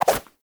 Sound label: Run Whoosh, Foley, Footsteps; Warframe; Lasrian; Lasrian Soldiers; Lasrian Butcher